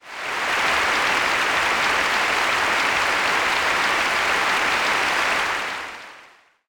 applause

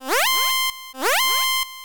warning

